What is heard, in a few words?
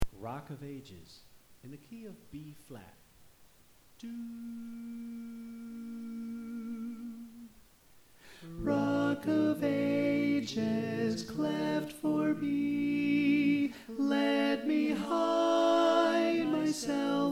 Alto